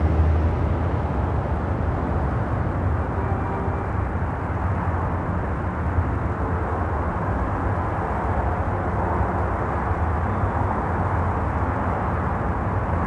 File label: elevated city ambience